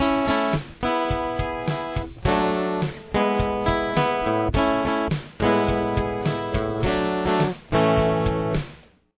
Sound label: outro